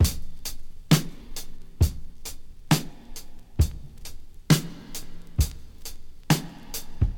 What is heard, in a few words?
67 bpm breakbeat e key, Free drum loop - kick tuned to the E note. Loudest frequency: 792Hz